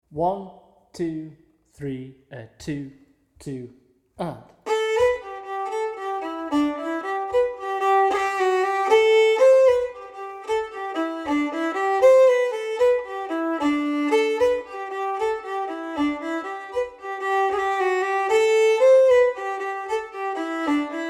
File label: Give Us A Drink Of Water - Fiddle Steady